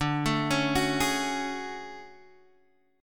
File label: D-Major Sharp 11th, D, Guitar, Open G